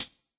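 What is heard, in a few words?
classic hip hop hi hat; STYLE KITS (Hip Hop, Etc); Classic Hip Hop Mega Kit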